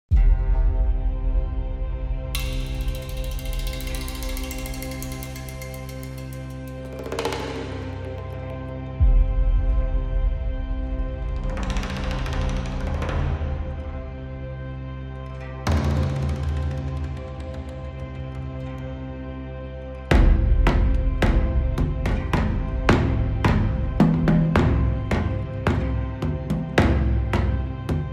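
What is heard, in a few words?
Ancient, battle background music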